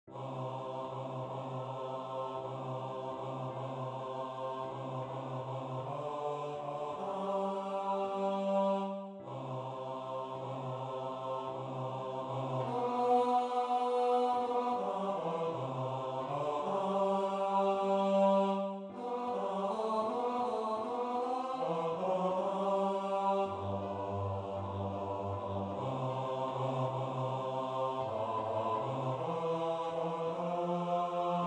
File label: bass; Sweet and Low